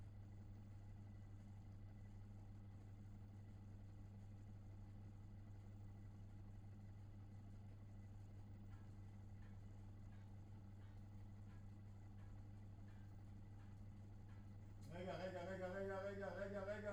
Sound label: Hammond Leslie